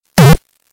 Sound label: jump crush loud